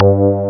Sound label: ELEK BASS; ELECTRC